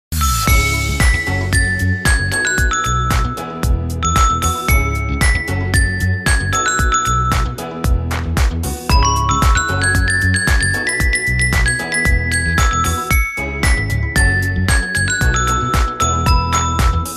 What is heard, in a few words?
Galaxy S24 FE